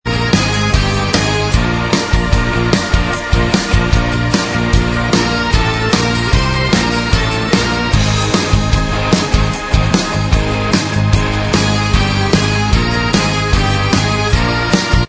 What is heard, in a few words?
Inst